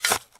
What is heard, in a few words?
Shovel Begin